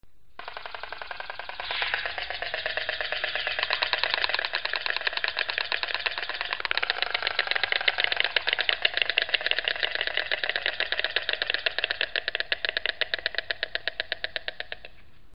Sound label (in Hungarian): feher golya